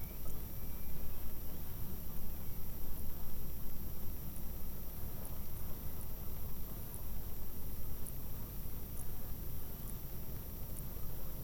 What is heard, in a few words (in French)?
DAUPHIN RISSO ralenti 4 fois